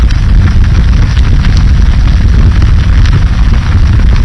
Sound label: amb fire